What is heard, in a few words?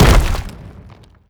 bash medium, brickwall, destruction